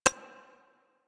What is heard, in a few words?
UI Common Hover; Warframe